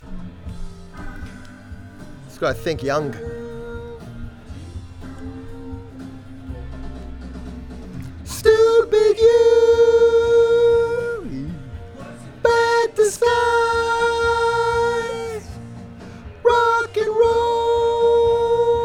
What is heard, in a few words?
VOX Back